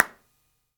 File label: hand clap sound a sharp key